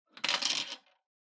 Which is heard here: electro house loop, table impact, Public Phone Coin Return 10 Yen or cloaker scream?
Public Phone Coin Return 10 Yen